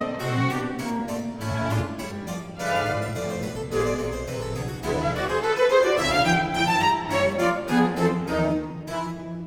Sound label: Orchestra